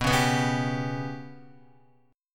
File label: B Suspended 2nd Sharp 5th down Guitar; Bsus2#5 strummed